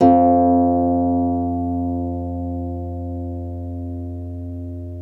Troubador Harp

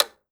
SWITCH OFF; AKAI Anime Sound FX; SWITCHES

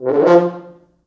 4 FrHorns FXMOD, F2; East West; Quantum Leap Horns Sax

